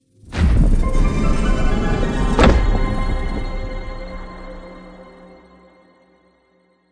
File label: intro free spin; hiddencoinsofegypt